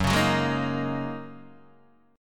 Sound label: F sharp 6th Add 9th F sharp Guitar DADGAD